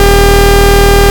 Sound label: bzz; turtlegame; spielemarmelade